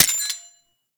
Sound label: Mech, Weapons; Gotva Prime